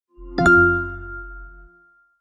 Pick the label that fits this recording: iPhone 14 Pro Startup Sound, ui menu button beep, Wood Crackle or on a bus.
iPhone 14 Pro Startup Sound